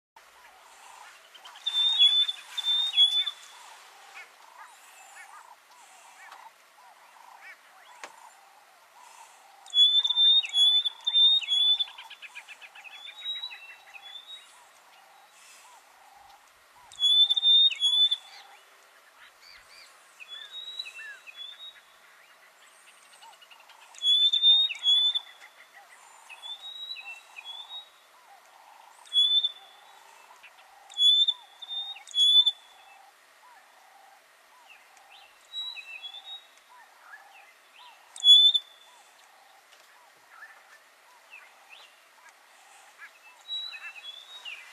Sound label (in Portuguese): canto do picanco rosado